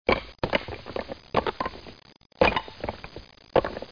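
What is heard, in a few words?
throw rock; Image Bonanza; Expert Software; ondajob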